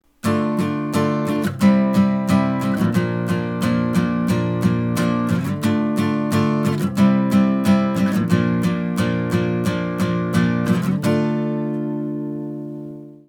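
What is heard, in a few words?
natural minor prog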